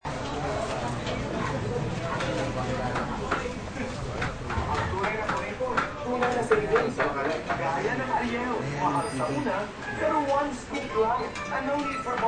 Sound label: living room tv